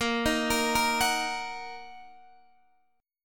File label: Bb Suspended 4th Sharp 5th Bb down Guitar DADGAD